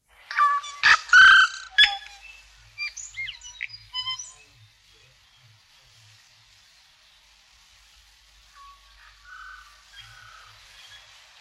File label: tui; Tūī Call; Whirinaki Rainforest